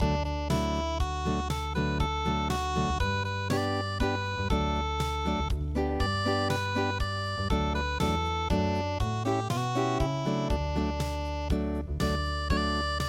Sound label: unpluged, midi; seikurabe